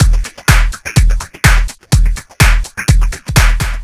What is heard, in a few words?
Dark Dirty No Hats, Drum Loops 125bpm, Nu Dance Electro